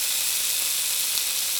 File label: Sfx Leaflight; Levels Hub Bayou; Draw Leaflight; Sfx Leaflight Steam